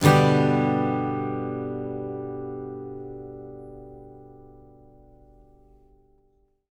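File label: acoustic guitar G major; Sampleheads - Whole Lotta Country 1 & 2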